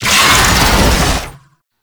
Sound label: Infested Melee Start; Oni